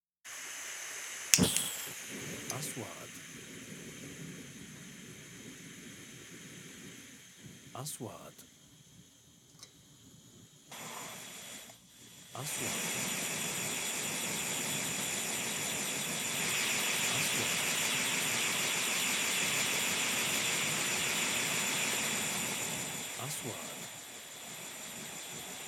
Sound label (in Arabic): صوت فتح نار المطبخ قلي